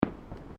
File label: Fireworks Single Firecracker; Fireworks (Sound Effects:Backgrounds)